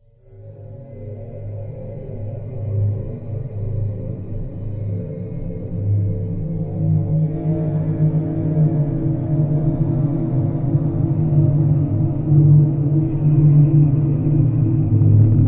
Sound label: horror background; apartment-1303